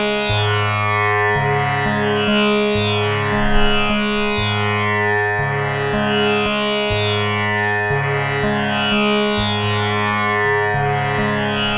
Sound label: Tampoura Drone, East Indian; Sonic Foundry (Sony Creative Software); Continental Drift Aftershocks